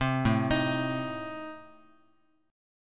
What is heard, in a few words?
A Diminished A, Guitar; Adim arpeggiated; 3,5,6,x,x,x; JGuitar